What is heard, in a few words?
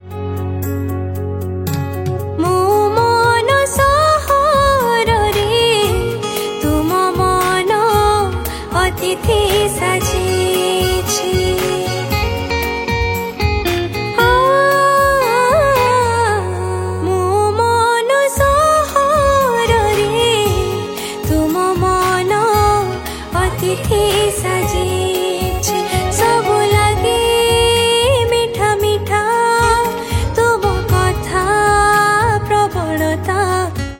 Odia Romantic Song